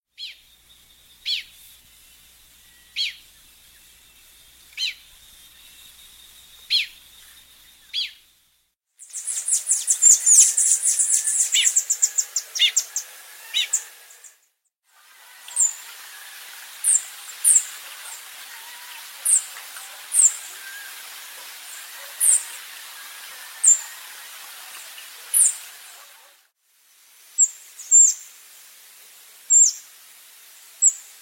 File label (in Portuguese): canto do black crowned tanager